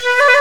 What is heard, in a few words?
FLT FIFE, British Fife, Fife Trills